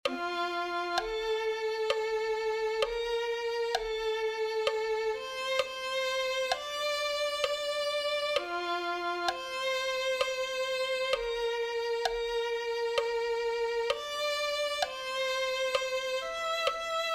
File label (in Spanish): la senda del justo violin